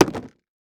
Impact Debris Large Plastic Hit By Plastic Tube; Impacts

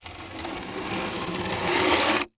Foley Plastic Scrape On Concrete Slow

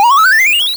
Victory; Audition

